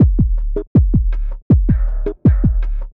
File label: DRUM LOOP ambient 160